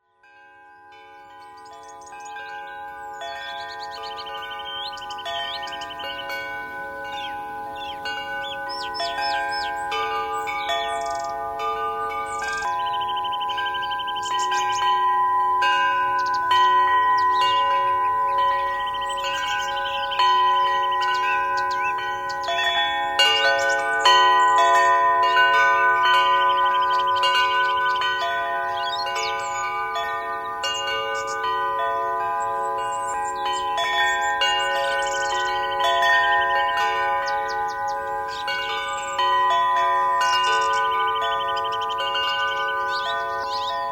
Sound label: chimes